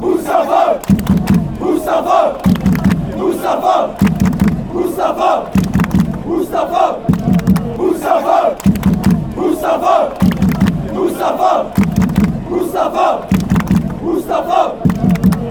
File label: bohemians chant